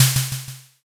snare

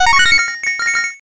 jackpot

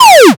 laser

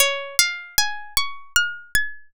harp

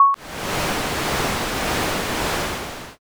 aircon

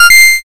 correct

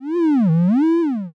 tree creak

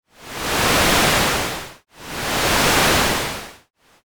shoreline

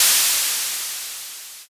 steam

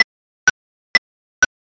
ticktock

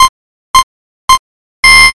countdown

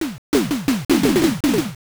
rockslide

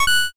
judge good

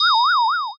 flashback in

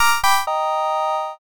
ending

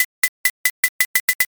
hyoshigi roll

